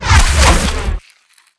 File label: woosh